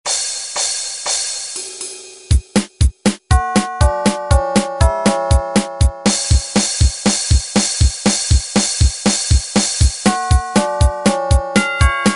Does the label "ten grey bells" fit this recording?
Yes